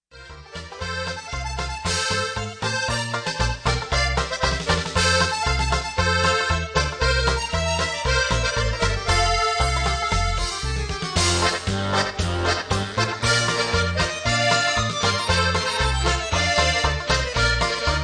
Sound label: Polka